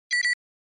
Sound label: Short Accent Double